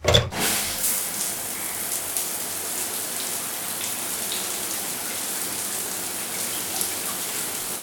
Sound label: shower